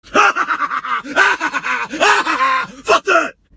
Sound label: laugh explicit